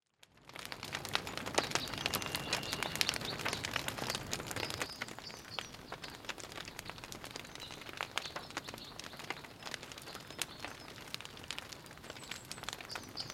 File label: Ambient; Age of Empires III; cinematics